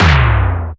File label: Big E-Tom; Roland MV8800; SONIQ DRUMS